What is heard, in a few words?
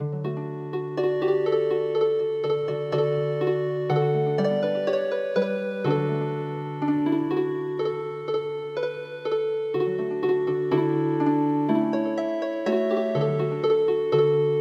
Healing Harp